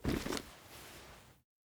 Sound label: salvaged icepick deploy; Rust